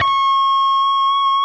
PRS D, Guitars, LEAD

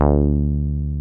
303 BASIC D3; Drums In Your FACE!

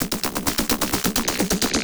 Beat, Industrial

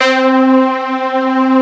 Digi Strings C3; Proteus 2000; Digital Sound Factory Proteus 2000

